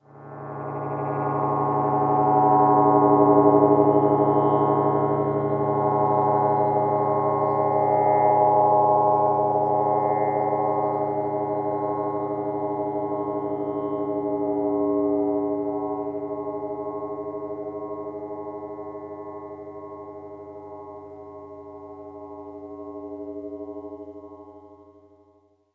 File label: DISSONANT RING MODULATION, DEEP ATMOSPHERICS; Sonic Foundry (Sony Creative Software); Vir Unis Infusion